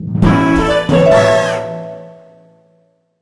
Bgm open land

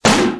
Orange Ball Boom

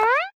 jump

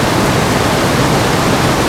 Transform Flight; Soda

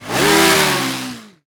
Transform Flight; Kiwi

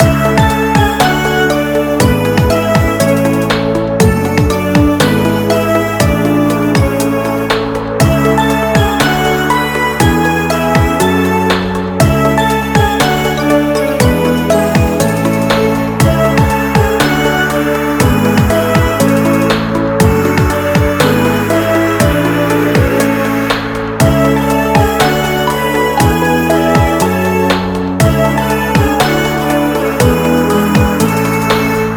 BGM; Tower of Frozen Waves

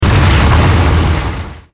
Transform Flight; Roll Cake

